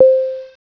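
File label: elevator beep; ambience; vendetta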